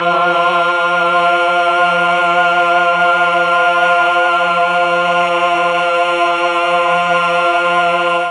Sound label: F2 8VOICE CH, mellotron; 8VOICE CHOIR